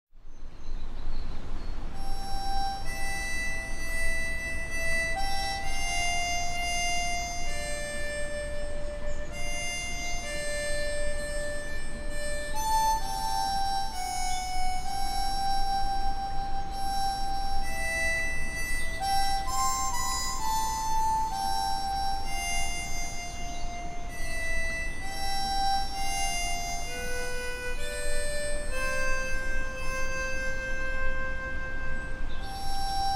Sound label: Harmonica Melody; Moomin Music